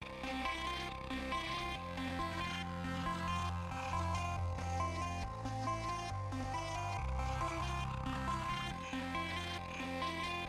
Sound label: bgmusic